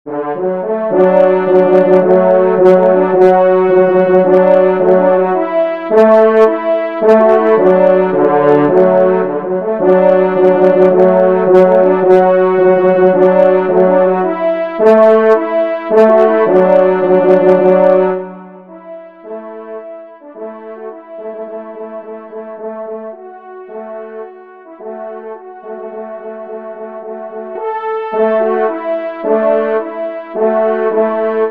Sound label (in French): trompe; La Nicoise